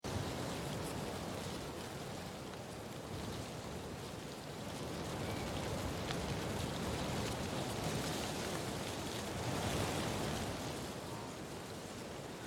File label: ambient